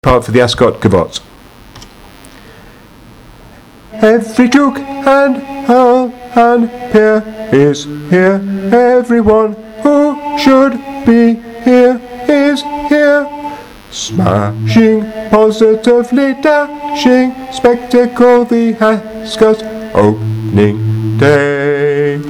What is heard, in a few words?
bass baritone ascot; My Fair Lady